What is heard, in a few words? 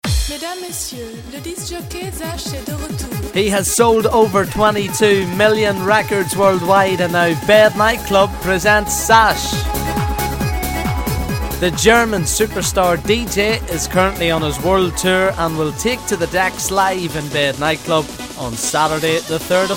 nightclub